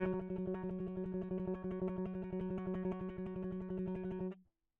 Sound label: electric